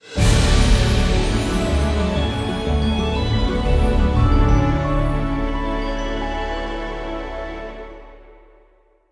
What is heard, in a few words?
outro Bonus Game; fairyred